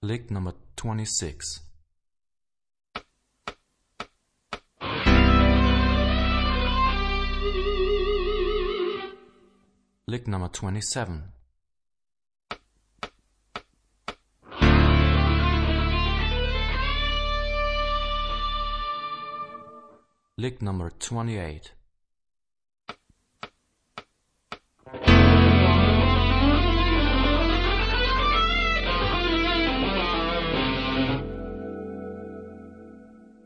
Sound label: Rock guitar; Rock gt Secrets